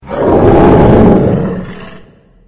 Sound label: pain; slender; guardian